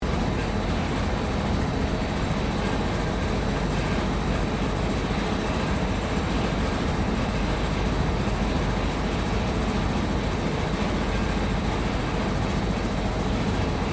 MACHINERY CHEESE FACTORY OPERATING LOOP STEREO; Cheeseworld!; Machinery (Sound Effects:Machines)

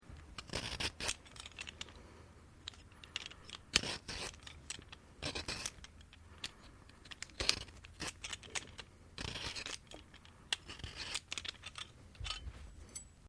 Carrot peel; Food preparation; Restaurants

